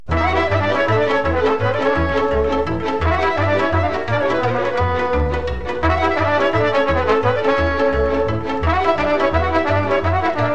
Sirba - Roumanie

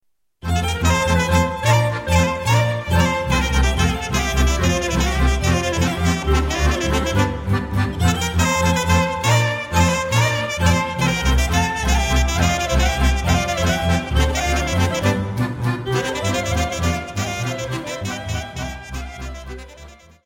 Briul - Roumanie

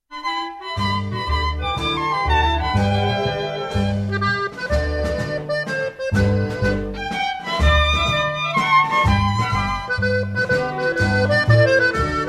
Courante Normande - France - Normandie

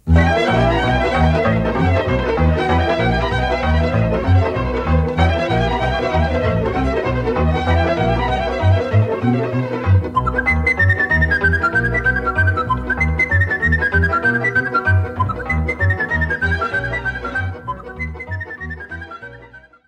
Sirba - Roumanie; Sirba Ploestenilor

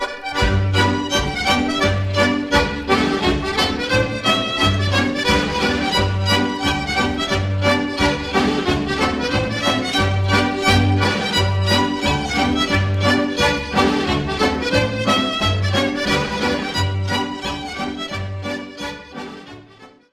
Polka - Ecosse

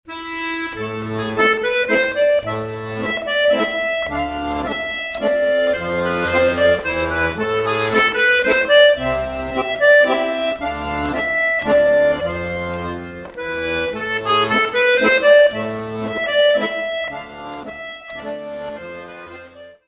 Menuet de la Chaine, France

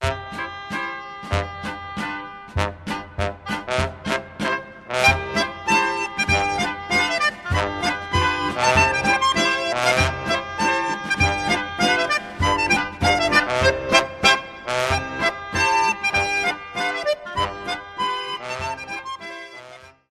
Polka - Suisse; Polka de Koppinger

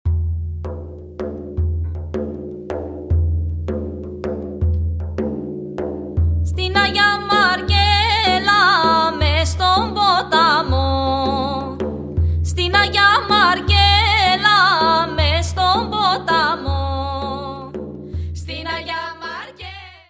Syrtos (Chios) - Grece

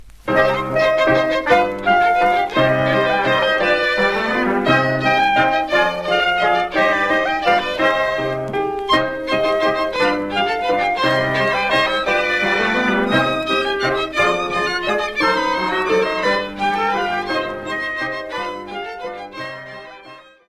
Passe Pied, Belgique

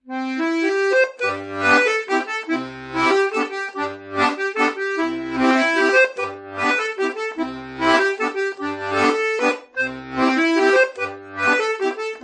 Mazurka de la petite Fille, France

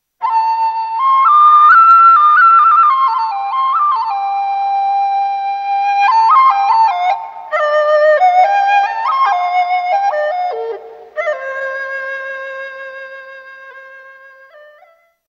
instruments di

